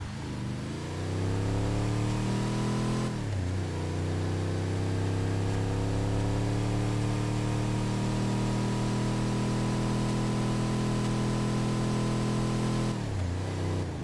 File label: truck v8, vehicle sounds; engine sounds; fbi2,sheriff2,pranger,granger2,yosemite1500; FiveM